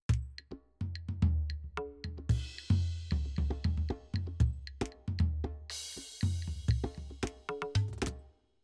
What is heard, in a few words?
Perc Tribal; Simmons SD1200